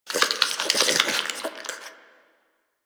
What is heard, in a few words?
Cave Insect; Warframe; Quests; TheNewWar; Ambience; Drifter Camp; Insects